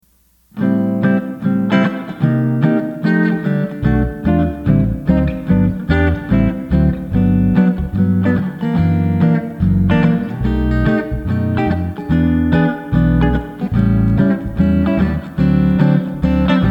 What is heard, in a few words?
backing Track; G, 1st position